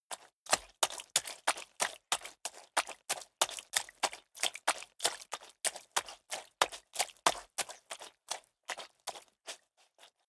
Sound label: step water run sequence